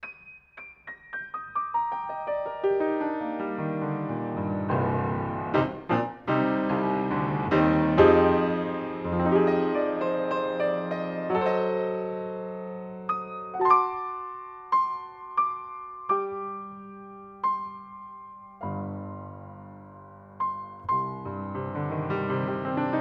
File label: Piano, Close Outside Pair